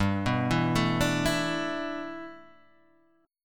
G 6th Add 9th, Guitar, Open D

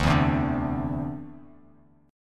D 7th Suspended 2nd down, chord; D7sus2 strummed

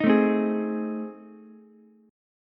A Minor Major 7th Flat 5th A, Ukulele; AmM7b5 strummed; 8,9,8,11

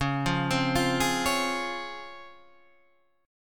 C sharp Major 7th C sharp

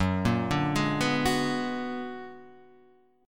B-Minor Major 13th

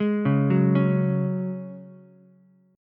Ab 7th Suspended 4th Ab Ukulele; Ab7sus4; arpeggiated; chordshape; 1,1,2,4; JGuitar

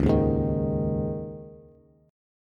C sharp Suspended 2nd, Bass; strummed; 9,6,6,8

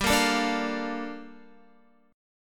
G Minor 9th Guitar; Gm9; strummed

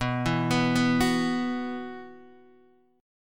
B Suspended 4th, Guitar, Open D

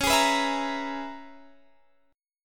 C sharp Minor 13th; C#m13; strummed; JGuitar

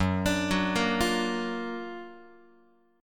F sharp Augmented Major 7th F sharp Guitar; arpeggiated

